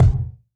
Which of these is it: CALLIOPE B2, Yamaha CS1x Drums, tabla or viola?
Yamaha CS1x Drums